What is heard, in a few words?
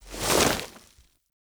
Lean Foley; Ballas; Warframe; Sacrifice; Sacrifice Shrine Intro; Cinematics